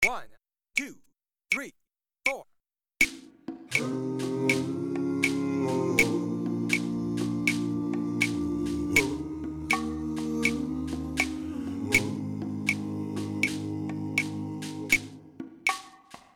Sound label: Mono Click